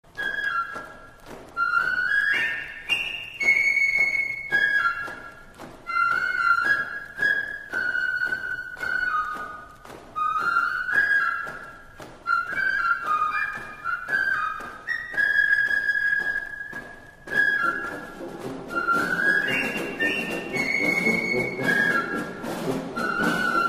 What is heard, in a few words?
march